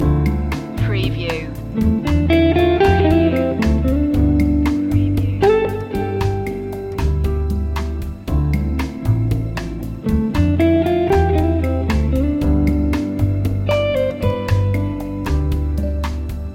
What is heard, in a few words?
moody bossa loop